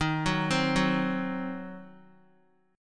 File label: D sharp Minor 6th D sharp down Guitar; D#m6 arpeggiated